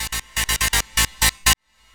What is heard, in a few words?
Synth